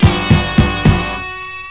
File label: guitar intro